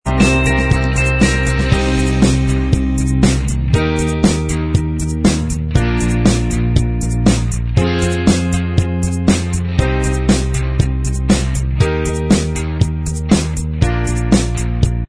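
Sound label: instrumental, backing track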